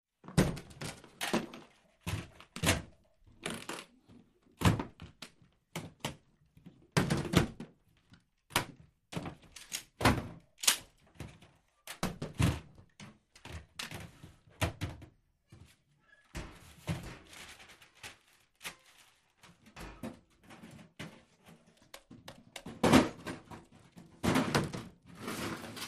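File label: Heavy Metal Movement, chains handcuffs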